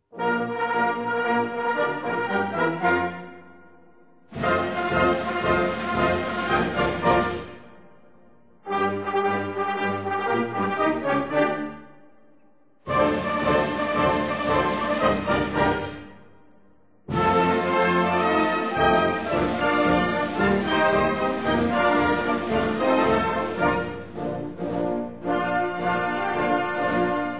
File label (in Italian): Inno Nazionale Italiano Il canto degli italiani; M NOVARO